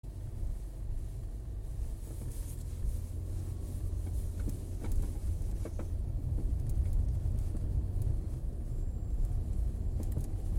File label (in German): Klappern